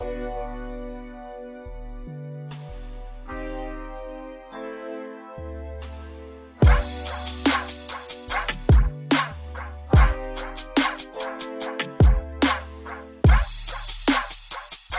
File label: beat